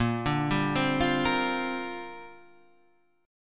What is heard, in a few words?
G Minor 11th A sharp